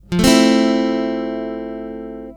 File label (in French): accord fa majeur simplifie, l’accord de Fa Majeur 7 en vitesse normale; guitare